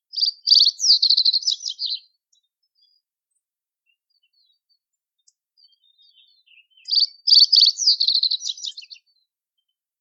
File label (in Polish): potrzos śpiewający samiec